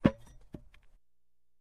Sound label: Axe Falls To Ground, Dull; Axe Fall On Ground With Dull Thud And Light Bounce. - Light Metal Thuds